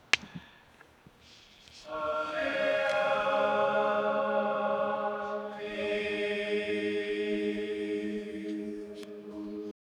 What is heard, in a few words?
soft chanting echoing of